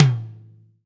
TOM 2; DRUMS; Best Service; Alpine Volksmusik

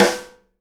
SNARE RIM, Live Snares

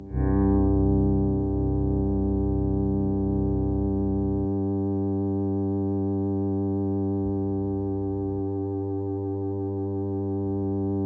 C2, Synth Line; 90 s Sample CDs; NiceBeats; SynthLine VOL-32

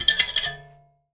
Finger Hat; Battery 3 Sample Kits

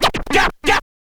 scratch kit, vinyl

